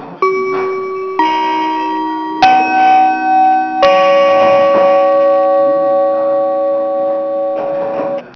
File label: school gong